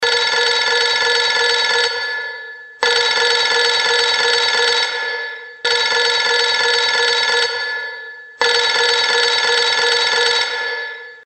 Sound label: bell